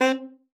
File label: C4, alto sax; Xsample VOL-2